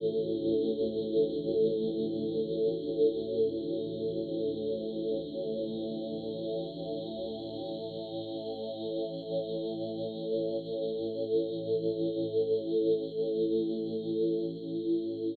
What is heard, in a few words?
SYNTHPAD ETHNO 125 A; eJay; Sphere